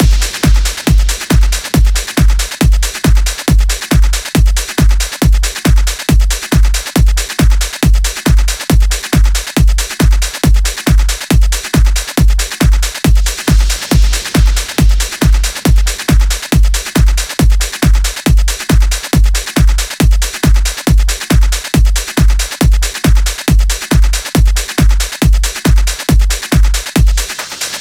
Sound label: Drum Mix 138BPM 16 bars; Drums & Perc; G#minor